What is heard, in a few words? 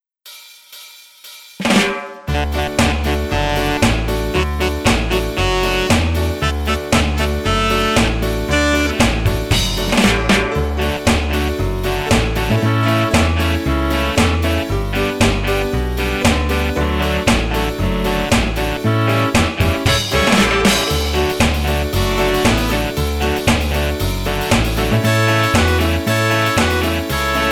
karaoke